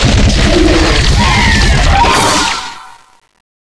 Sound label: teleport skill in; lilith